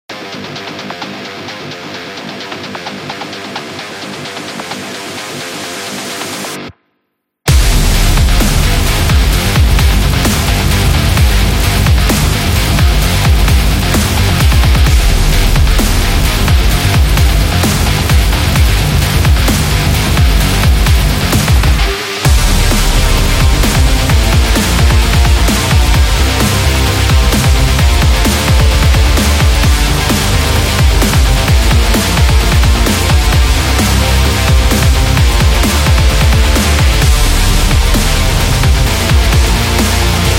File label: Battle Royale, metal